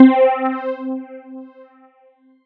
SY PHASOR; ModelCycles

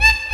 Harmonica A4; Proteus 2000; Digital Sound Factory Proteus 2000

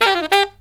SAX

